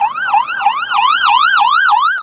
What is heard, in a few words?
alarm; Ueberschall; Techno Trance Essentials